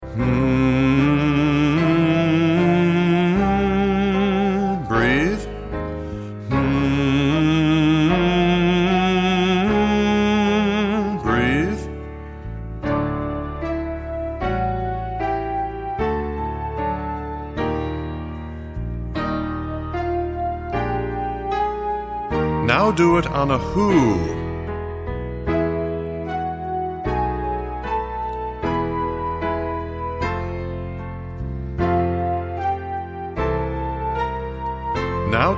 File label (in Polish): cwiczenie hum hoo ha